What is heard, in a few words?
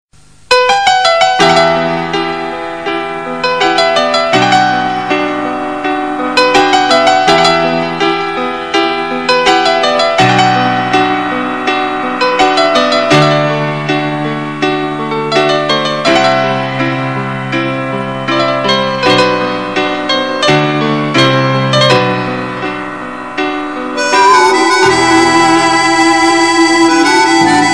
Turkish Tone Ringtone